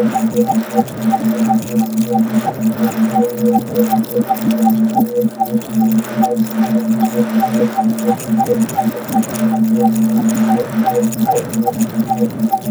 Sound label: ambient loop